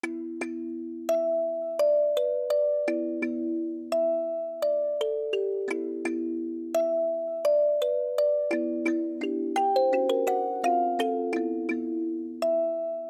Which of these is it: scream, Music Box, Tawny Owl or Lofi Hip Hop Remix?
Music Box